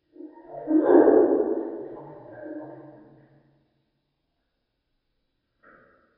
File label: bird budgie song; dfcaverns; df ambience